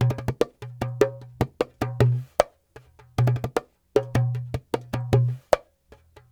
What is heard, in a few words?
Dumbek